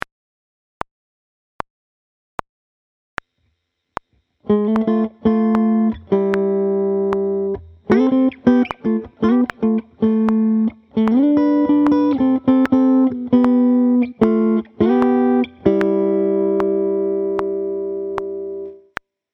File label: Pop Sixths